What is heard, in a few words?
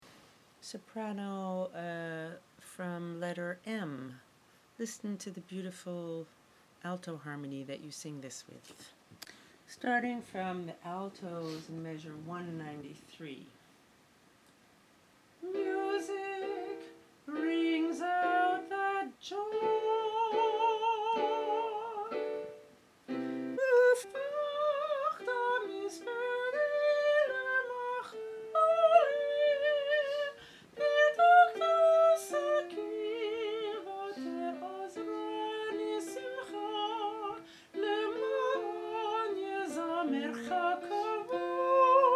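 Great Shofar soprano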